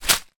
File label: impact small branch leaves fall hit ground; zapsplat